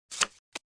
cards card flip; burns casino

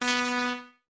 bunny electrocuted

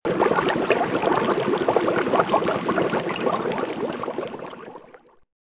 green beer fountain tap; ea tsto; st patricks day

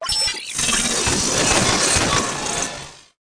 robot ant tap; monorail

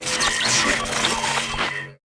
tv menu; daily task; simpsons

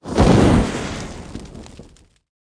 christmas tree slightly irregular; winter2015

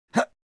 hugs tap

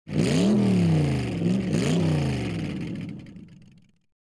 secret agent car; secretagents

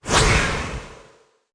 challenge dismiss; daily challenge

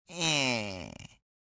wind

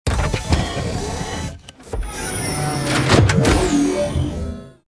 town square; vigilant; secretagents

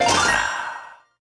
casino slots whisky; burns casino

